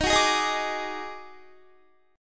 D sharp Minor Major 7th Double Flat 5th D sharp Guitar; strummed